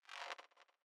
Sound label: zap